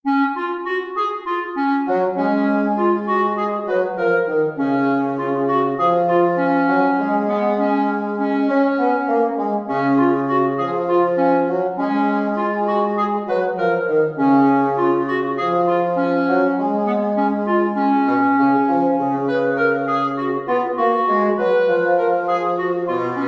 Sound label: Db Mixolydian